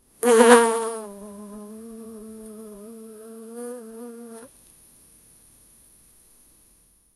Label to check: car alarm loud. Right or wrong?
Wrong